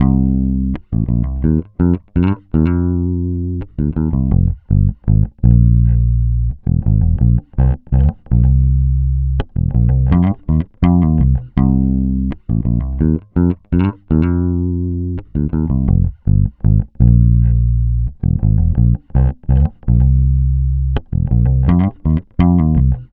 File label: Bass loop 83 C#m, Electric Bass Loops Direct; Slate Digital BASSHOLE Sample Pack